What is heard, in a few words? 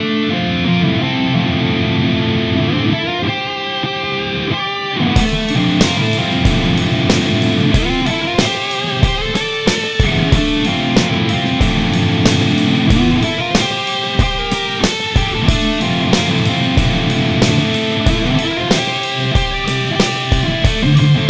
FGN J Standard Mythic 7